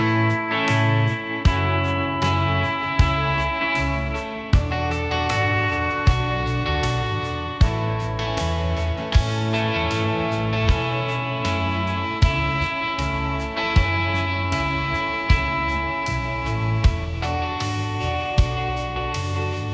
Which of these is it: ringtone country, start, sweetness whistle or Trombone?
ringtone country